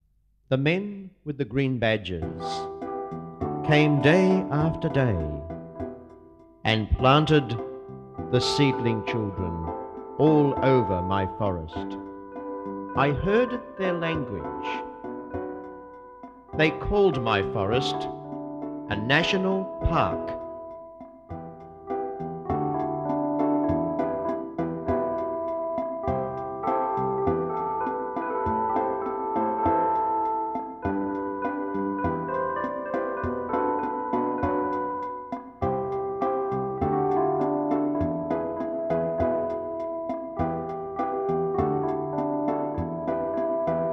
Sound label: Backing track